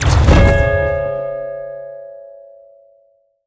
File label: bonus Scatter; netent